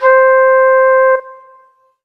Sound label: Flute C5; Korg NS5R